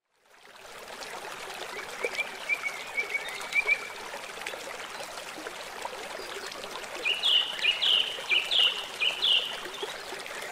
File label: Relaxing River Sounds Gentle River Nature Sounds Singing Birds Ambience